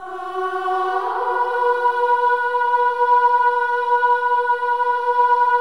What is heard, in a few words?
Voices, INTERVALS, 4TH UP; Spectrasonics; Symphony of Voices